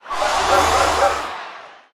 crowd death; svencoop; thearena

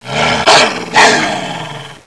gonome melee; svencoop

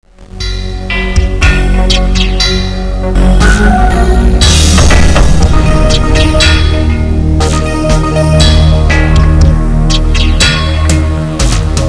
robot industrial theme; svencoop

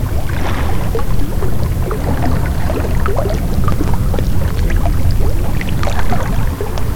water; silent hill

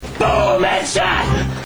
boom; dod

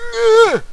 player pain; firearms

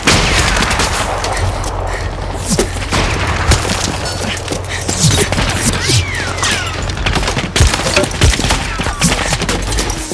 ambience

